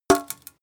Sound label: paint metal tin hit bright